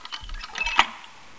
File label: warehouse door unlock